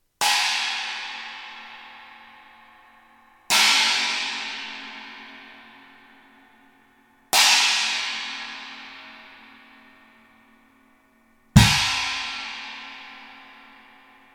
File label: China 20; Tigris; Amedia